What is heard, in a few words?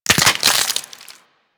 Infested Maggot Cyst Break; Warframe; Abilities